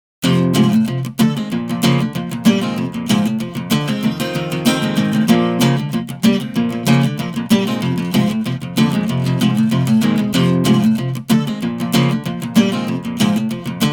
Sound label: Drunken Guitar; Bright BG